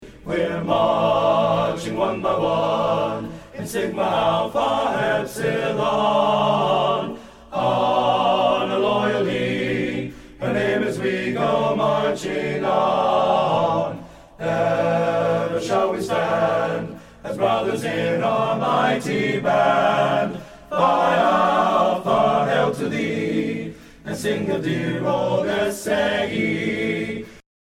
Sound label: Marching